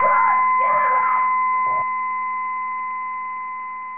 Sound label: flatline